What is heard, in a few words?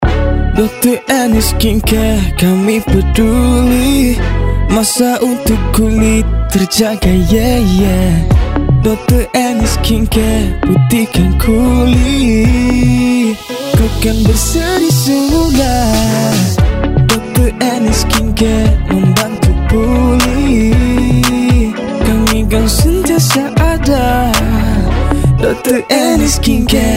Skincare Jingle; Dr Anne